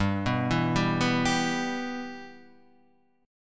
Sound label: E Minor G, Guitar